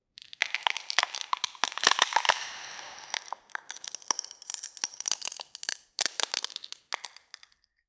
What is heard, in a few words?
cracker sound effect, explosions